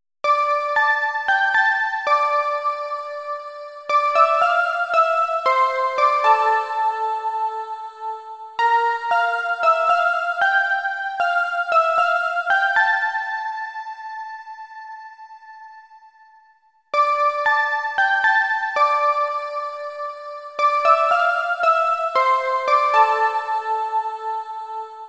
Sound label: arrange melody